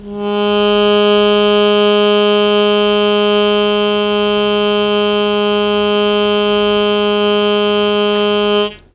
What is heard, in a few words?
Long Sustain Aa Ab0, Harmonica; Zero G - Harmonica